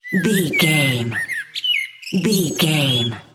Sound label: bird small songbird call chirp